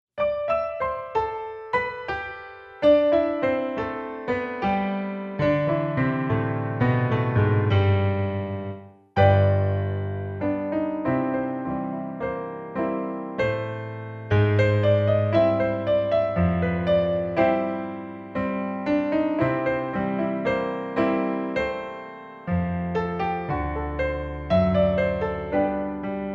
The Entertainer Piano; Joplin; Laura Cummings

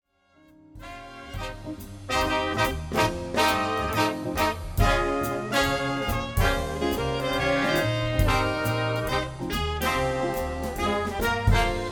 big band

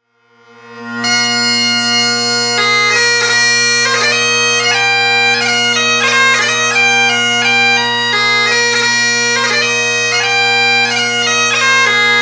bagpipes

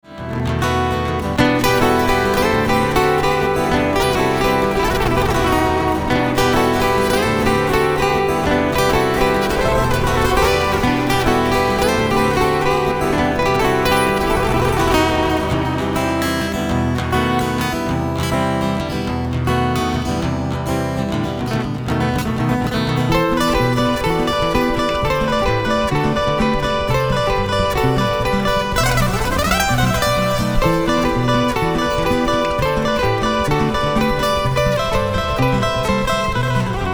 Flamenco Fusion Fire, acoustic guitar

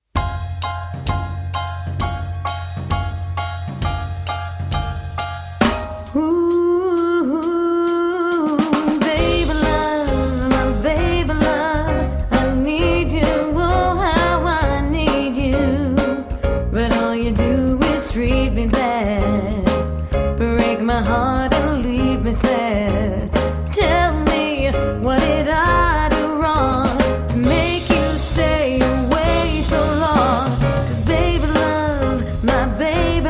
Motown Classic, jazz band

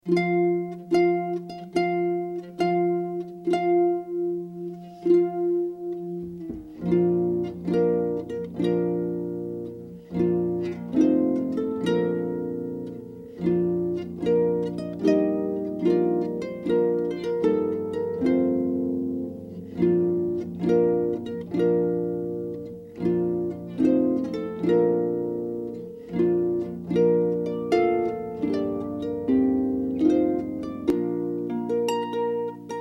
Harp; Wagner Bridal Chorus; tradl Processional

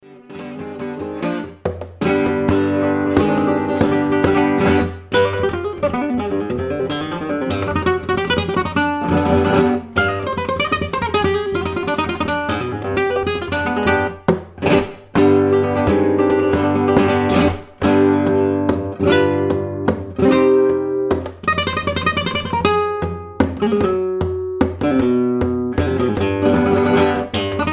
bulerias, flamenco guitar; Cordoba; lliam christy

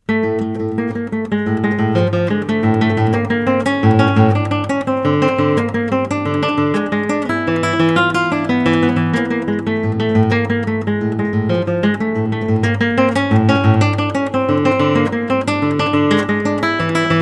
classical guitar

